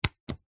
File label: technology radio switch toggle click; zapsplat